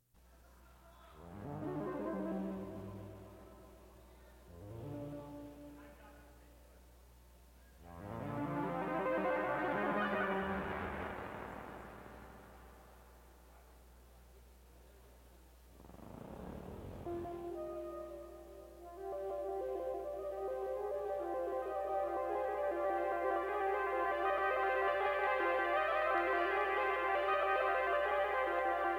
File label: computer synth